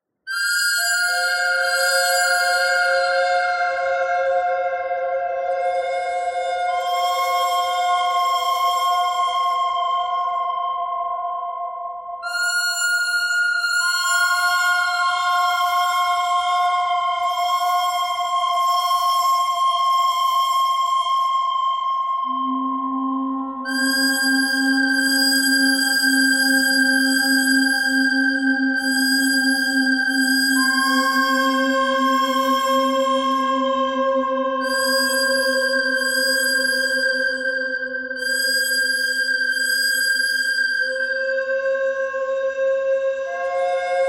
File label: bird thread simple